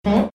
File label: boing